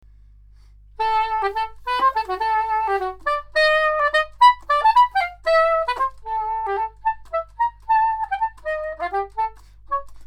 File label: soprano saxophone